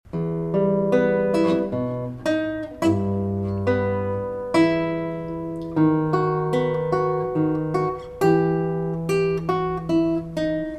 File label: Minuet Reverb